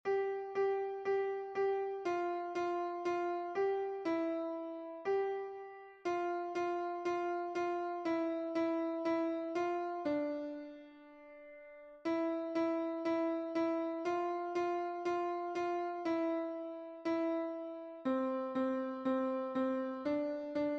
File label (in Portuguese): O Sino Azul Melodia